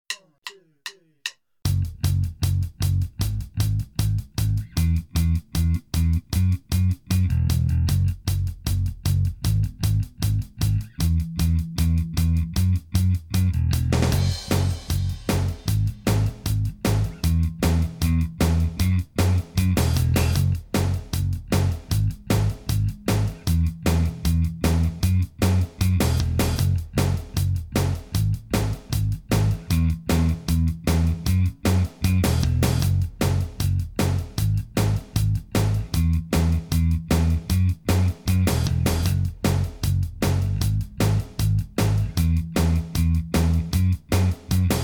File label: Basstrack 154bpm; Intro & Verse